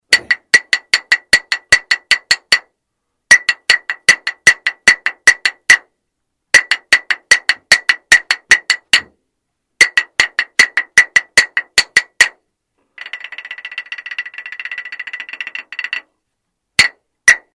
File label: clapsticks